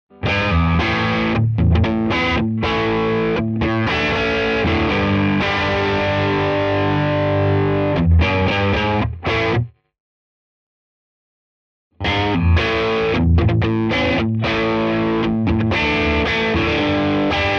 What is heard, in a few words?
TS Crunch Riff